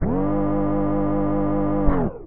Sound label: Lift